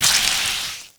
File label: Wet Paint; The Princess and the Frog; wii windows; Hub Orleans; Draw Wallpaint; Wet Paint Brush